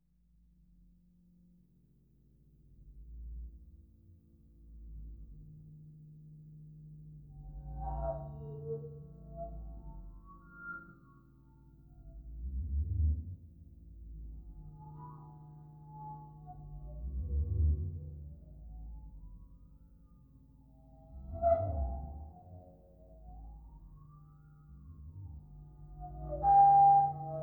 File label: Backing track